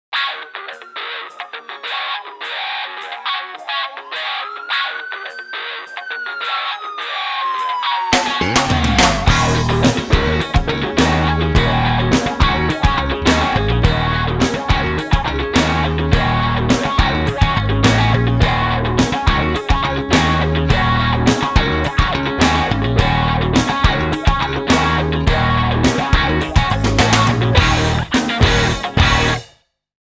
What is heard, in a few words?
Genre Rock